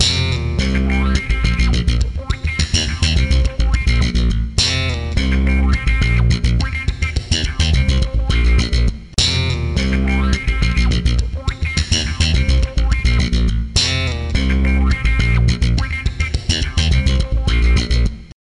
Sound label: disco of death; oneiros